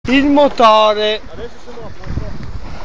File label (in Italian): il motore